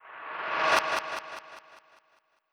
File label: fade in fast echo